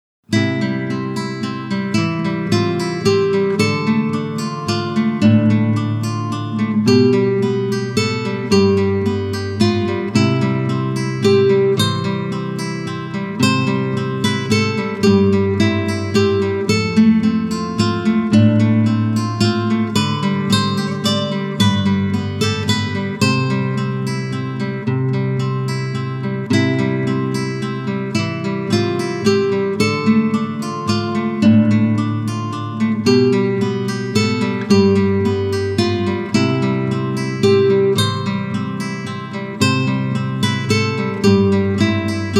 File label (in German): walzer, Gitarre; klassisch